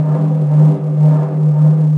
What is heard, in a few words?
garbage; ambience; narshaddaa